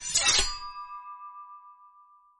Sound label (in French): scie